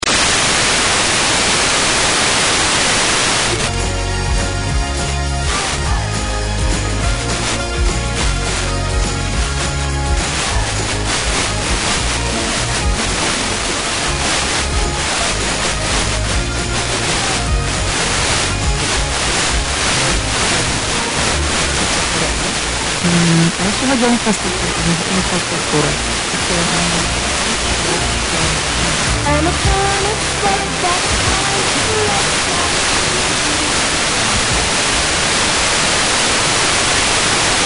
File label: slovakia